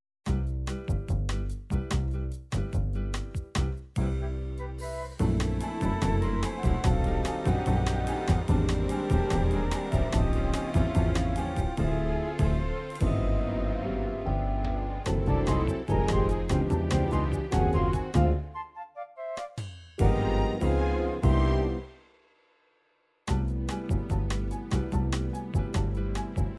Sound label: bossa nova, midi